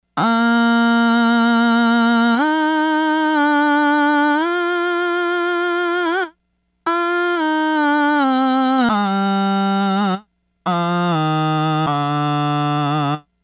Cathedral; Roland SRV-3030; Preset; RSS Cathedral

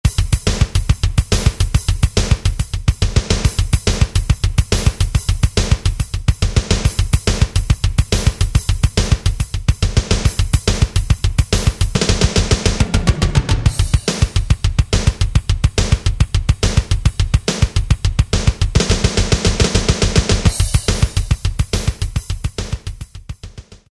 Hard Rock, Alesis SR-16; Preset Patterns

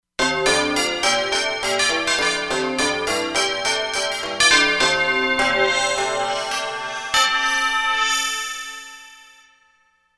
Biwa Bell, Korg MSC-13S Ethnic 2

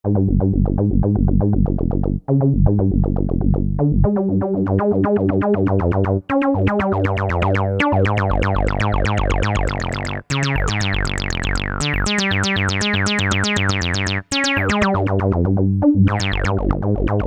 Bs Line Sw MW, Alesis Z2 EuroDance

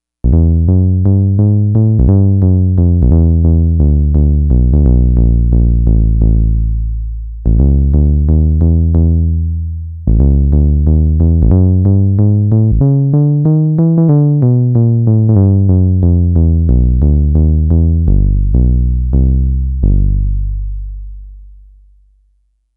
BASS Acoustic

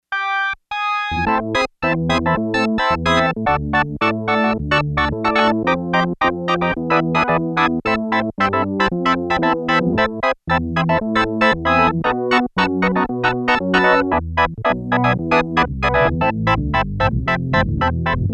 Magic Organ; Yamaha TX802; Factory Patches; Voices

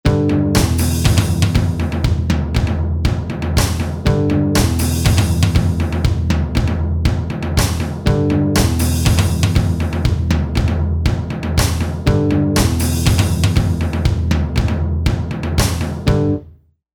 Hard Rock

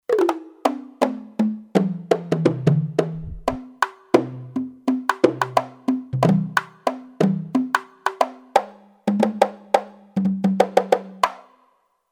Dynamic Perc, Kurzweil K2500X; Factory Programs

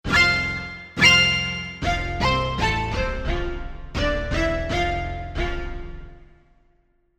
Tutti, Korg MSC-1S; Korg MSC-1S Variety

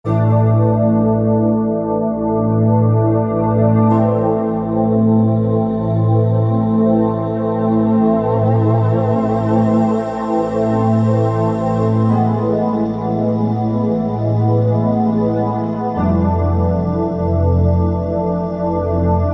Wave PAD, Korg MSC-2S Synth; Synth 1

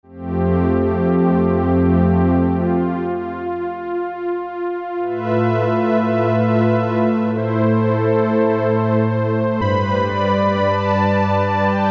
Strings; Cheetah MS6

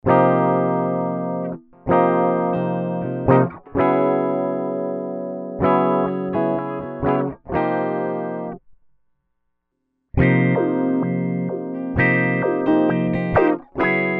Electro-Harmonix Stereo PolyPhase

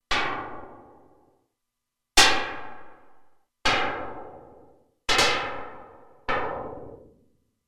Flange Shot, Roland Alpha Juno-2; Factory 2

